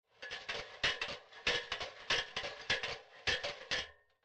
ladder; modern warfare 2

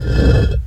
block vanish; modern warfare 2; catherine

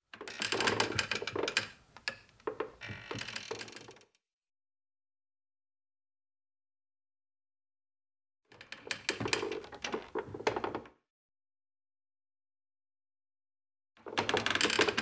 wood creak light; modern warfare 2; amb emitters

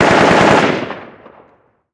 gun ak47 med; modern warfare 2; amb elements

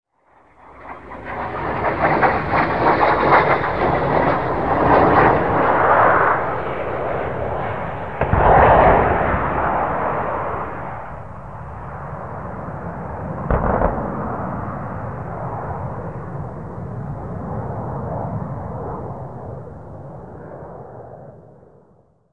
jet flyover stereo; amb elements; modern warfare 2

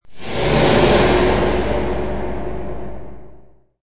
metal; amb elements; modern warfare 2

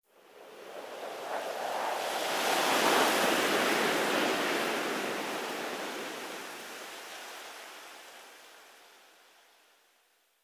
wind leafy whistle; amb elements; modern warfare 2

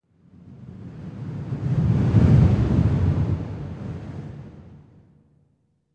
wind buffet; modern warfare 2; amb elements

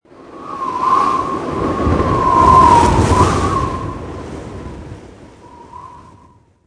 windgust; amb elements; modern warfare 2

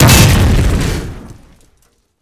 explosion door metal breach; cod modern warfare 2

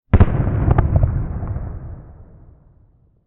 explosion low dist; amb elements; modern warfare 2

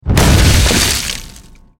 stone impact; modern warfare 2; catherine

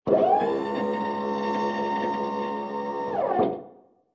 ac130 hydraulics; modern warfare 2; amb elements

